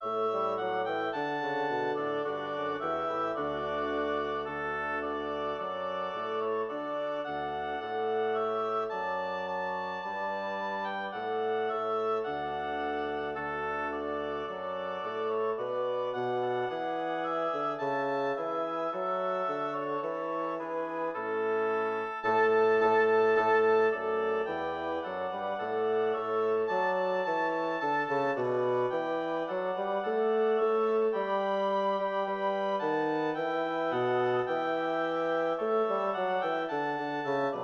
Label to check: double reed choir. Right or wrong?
Right